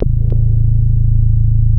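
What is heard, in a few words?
wall moog, bass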